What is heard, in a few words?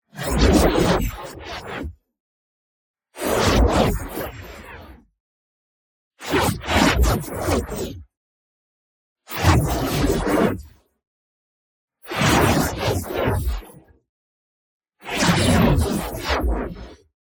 ABILITY RELEASE Noise Suction; boom library; Matter